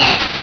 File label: cry; source of the sovereign